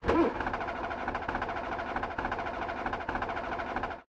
Cruiser Engine Rev; Lethal Company

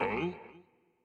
Aggro; Dinkubus

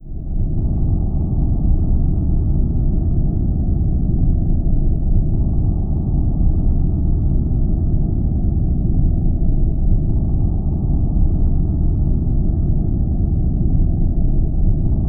California Rumble

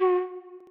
bg lead flute f#4; gamerip; lofi room demo

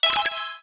Block Combo; Ui Block Combo6

